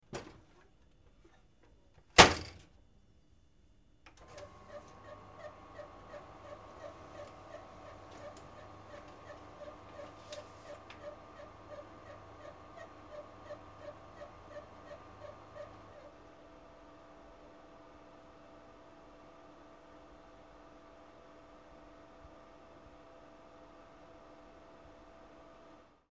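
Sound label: sounds of photocopier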